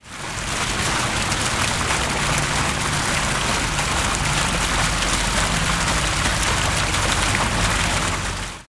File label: Fountain